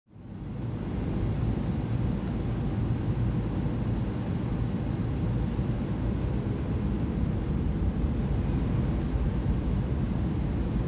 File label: Aircraft Near Engine Bay Hum